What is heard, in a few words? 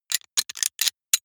sci-fi handling click; comedy-cartoon; pistolen-gewehre